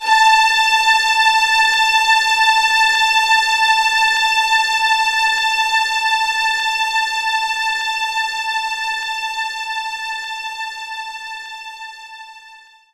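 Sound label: String Loop A4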